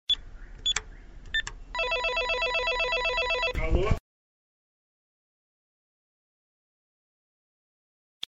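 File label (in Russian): звук набора и вызова домофона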